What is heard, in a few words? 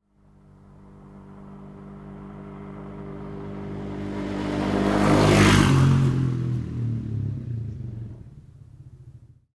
motorcycle passing by